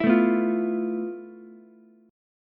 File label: F Major 7th Flat 5th Ukulele; strummed; JGuitar